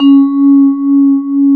VIBRA C#3

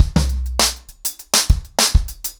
100BPM, ACOUSTIC DRUMS

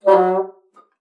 MULT, bassoon; Xsample VOL-6

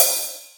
Open Hihat

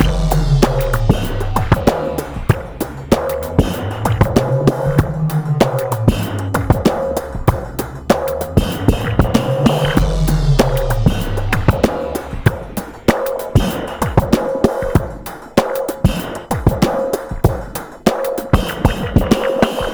96.3 bpm, break; 90 s Sample CDs; Planet of the Breaks

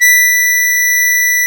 HARMONICA

